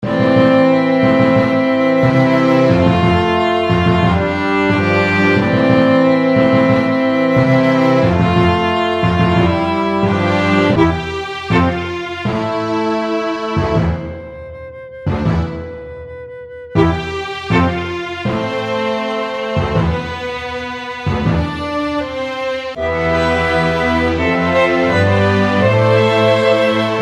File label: Orchestra Loops